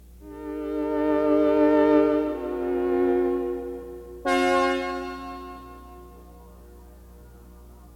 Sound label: Synthesizer parts